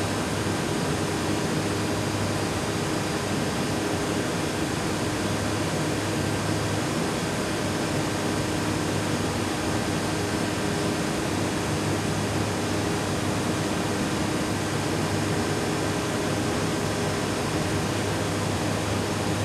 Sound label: generator huge; boneyard; Titanfall 2